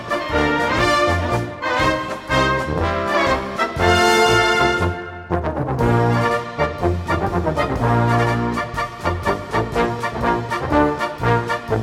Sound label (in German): Marsch